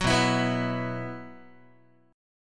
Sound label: B Suspended 4th, Guitar; Bsus4 strummed; JGuitar